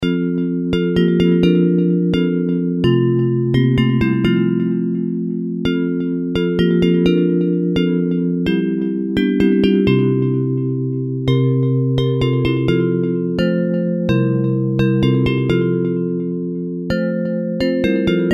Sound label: Bells